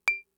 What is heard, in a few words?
Clave, Fricke MFB-522